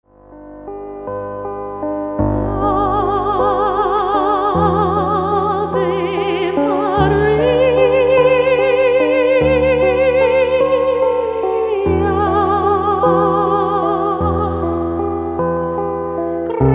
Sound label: Classical